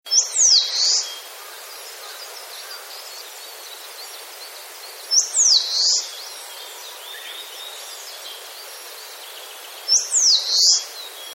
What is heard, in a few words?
sendai musikui